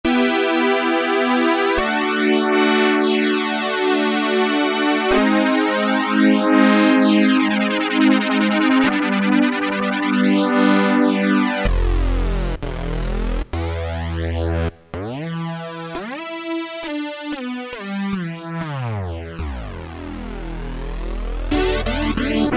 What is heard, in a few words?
Fat Squares; Factory Patches